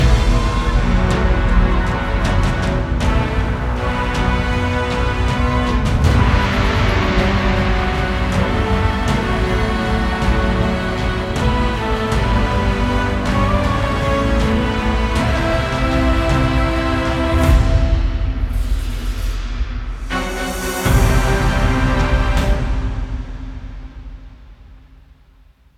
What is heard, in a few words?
Battle